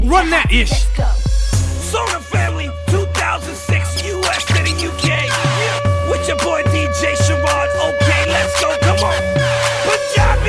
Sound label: The Coolest Rap